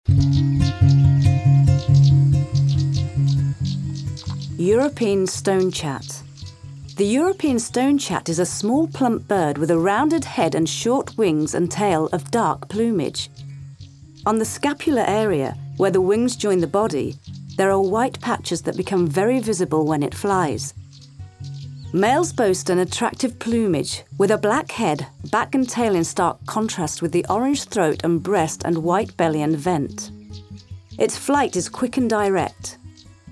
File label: stonechat; birds; lavanderas